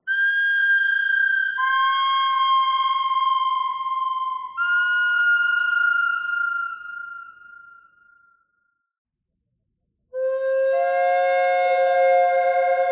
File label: bird thread harmonic; noreverb